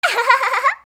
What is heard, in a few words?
ahahahaha; kanjou